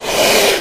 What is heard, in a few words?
Cobra, Indian Hiss. Close Perspective.; snakes